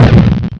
putt bang

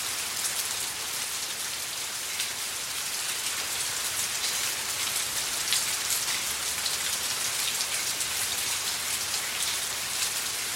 stream

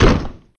hit

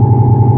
ufo

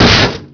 hit

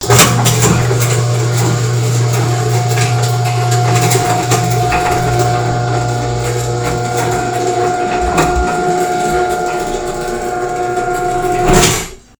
door close

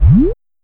menu up down

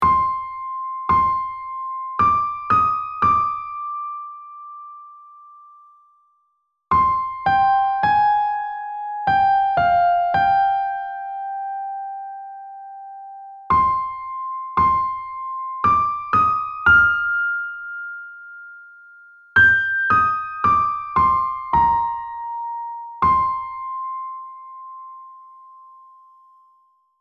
piano; mansion

